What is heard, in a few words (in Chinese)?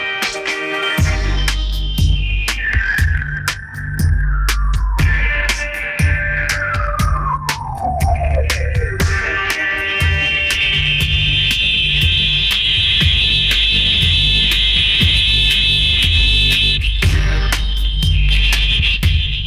颁奖音乐; 校园音乐列表